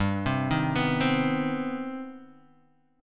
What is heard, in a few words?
C Minor Major 7th, G, chord